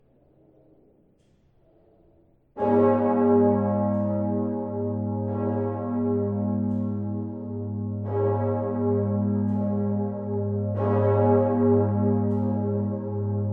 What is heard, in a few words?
bell south tower stephanus